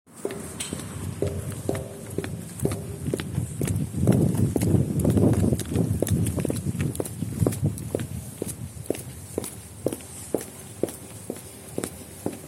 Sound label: heels, Rotterdam Field Recordings